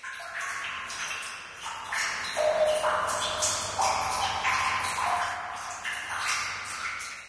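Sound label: ambient water drip loop; video games; nature; source engine; portal2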